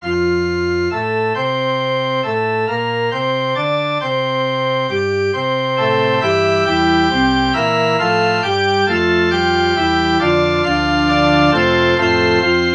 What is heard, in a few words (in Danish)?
forspil; ddk 150 giv mig gud en salmetunge